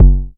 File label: analog deep, Kickbank; mpk mini presets; Ableton Foundation FreePacks